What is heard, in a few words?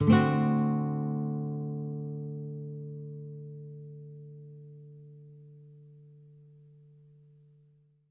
Nylon Chord D7, guitar; Nylon Guitar Chords